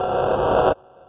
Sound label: shaving